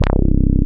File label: ROLAND JX3P, C1; Big Fish Audio - First Call Vintage Keyboards